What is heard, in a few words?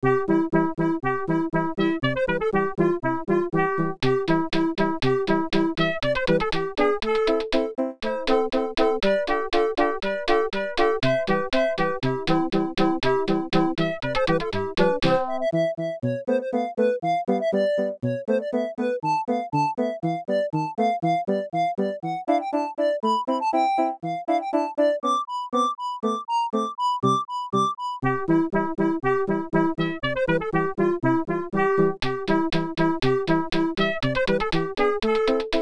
Synthetic music, Csound; 土耳其進行曲